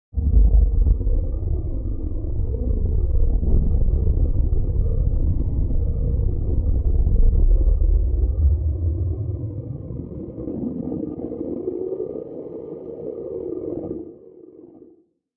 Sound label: Deep Fear, Deep windy creepy tone.; Deep Fear 1